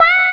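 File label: Guitar Wawa; Digital Sound Factory Xtreme Lead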